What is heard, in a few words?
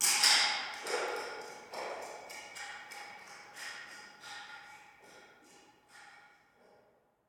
buildings rumble stairs plastic piece rattle; sonniss gdc